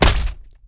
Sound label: Target Mouth Gobble; Diner